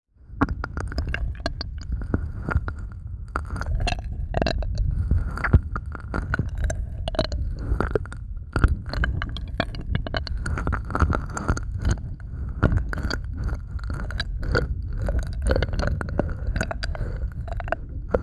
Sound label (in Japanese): 音圧強調耳舐め, ASMR; 【お口の音フェチ】生々しいおくちの音と耳舐めで気持ち良くなれるASMR♪